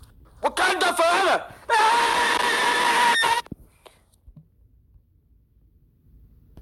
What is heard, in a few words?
AHHH; Wakanda forever